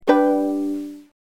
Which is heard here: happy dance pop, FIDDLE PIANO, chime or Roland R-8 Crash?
chime